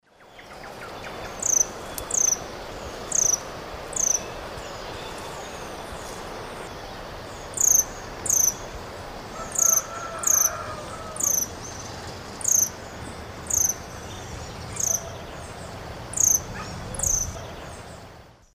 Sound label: Amazilia fimbriata, song; S. America; Eastern Lowlands